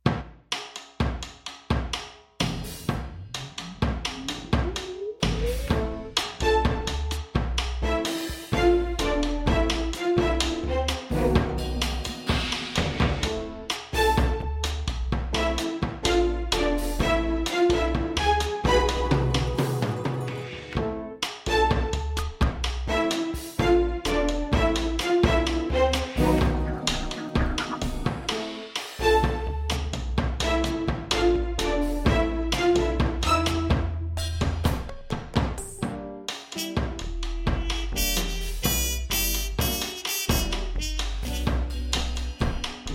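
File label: criminal music without words for background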